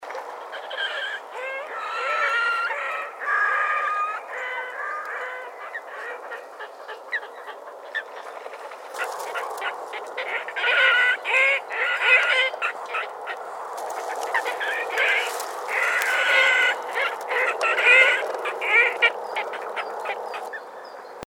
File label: Red necked grebe; كشیم گردن سرخ